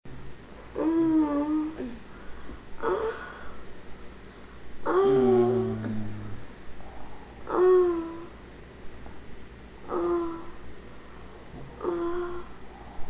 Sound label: oh oh oh